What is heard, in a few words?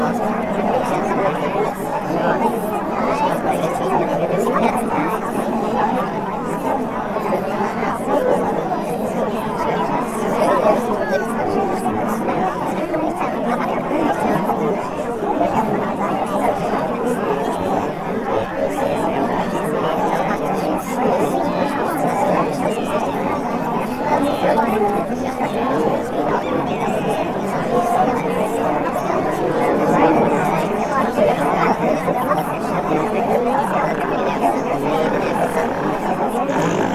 Cheering Soundscape